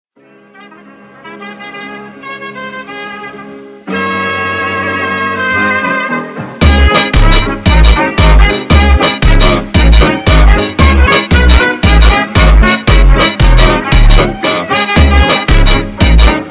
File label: FUNK Ultra Slowed